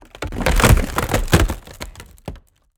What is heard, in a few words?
creature wood texture crack; Bluezone Corporation